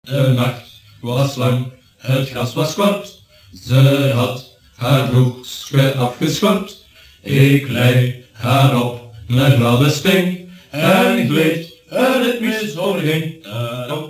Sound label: Tango